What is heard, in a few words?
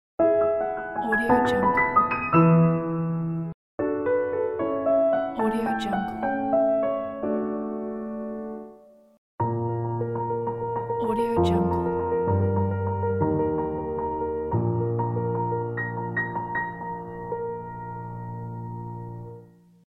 beautiful piano logo pack